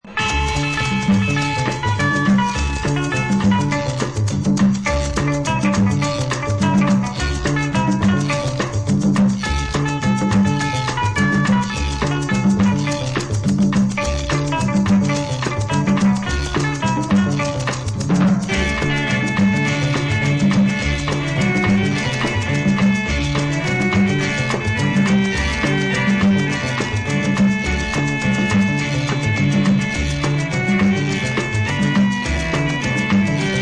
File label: horse step dance (guaracha)